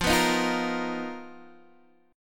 B Major 7th Flat 5th F, chord shape

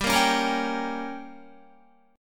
G Diminished, Guitar, chord; strummed; 14,13,13,15,13,15; 4F,4A,5C,5G,5C,6E; JGuitar

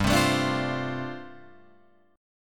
G 7th Suspended 2nd Suspended 4th, Guitar Open F; G7sus2sus4 strummed

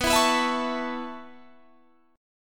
C sharp Minor 7th Sharp 5th B

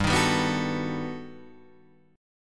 G Augmented Major 9th, Guitar, Open D

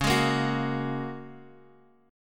G sharp 7th Suspended 2nd Suspended 4th

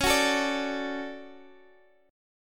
D Major C sharp, guitar

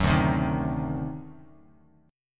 D 7th Flat 9th D down Bass Standard; D7b9 strummed; JGuitar

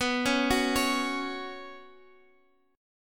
F sharp Suspended 4th B

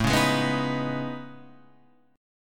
A Major Sharp 11th, Guitar, Half Step Down; AM#11

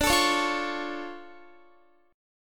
D Minor Major 7th D down Guitar; DmM7; strummed

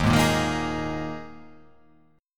Eb Suspended 4th Eb, Ebsus4 chord; Ebsus4 strummed; JGuitar

